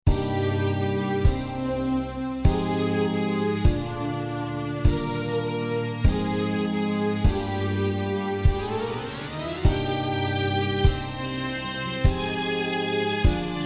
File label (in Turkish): keman, bk 5; ud